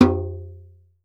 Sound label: EARTH TN, EARTH DRUMS; Voice Crystal - Steve Reid Definitive Percussion